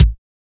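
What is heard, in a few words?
BASS DRUM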